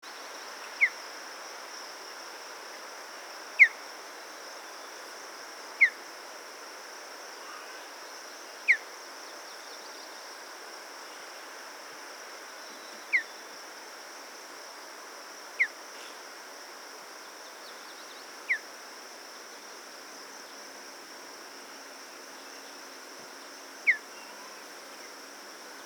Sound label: Mountain Bluebird Sialia currucoides; thrushes thrashers